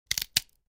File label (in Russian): zvuk pereloma kostey